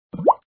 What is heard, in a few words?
fire sound; bubble t